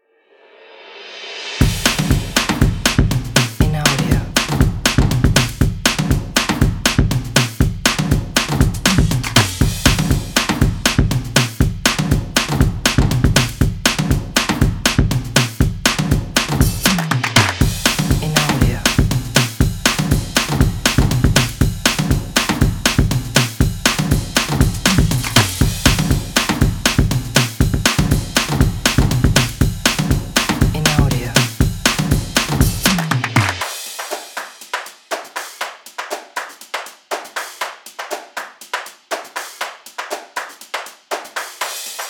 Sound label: Jazz Drums